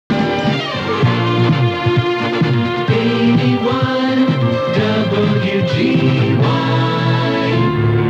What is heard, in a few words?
jingle; wgy